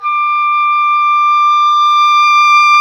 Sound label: WND OBOE Dry; Roland LCDP04 Orchestral Winds; Oboe 4-8; Oboe Dry 6